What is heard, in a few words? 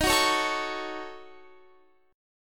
D sharp Minor 7th Flat 5th D sharp down Guitar; strummed